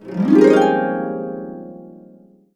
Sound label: UP140, Complete Harps; USB Soundscan vol.62; AKAI